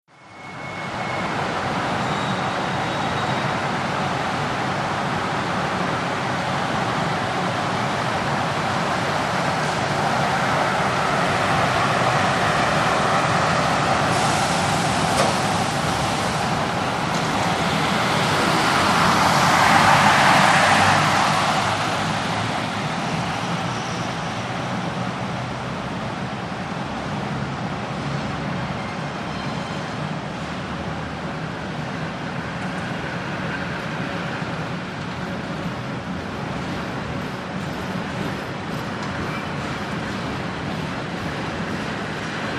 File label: TRAIN STATION: One train departs, another arrives, rather indistinct; AIRPORTS & STATIONS TRAIN STATION